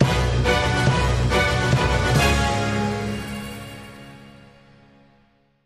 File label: free Spin Splash Screen Outro; steamtower